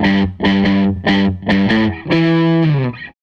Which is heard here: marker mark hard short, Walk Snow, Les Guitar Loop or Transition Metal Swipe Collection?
Les Guitar Loop